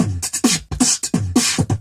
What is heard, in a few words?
fastah, Beatbox; Drum loops